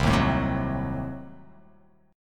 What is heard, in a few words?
C Diminished 7th C